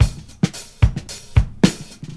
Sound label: 111 bpm hq drum loop sample A key